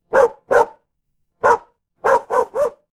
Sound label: animal dog bark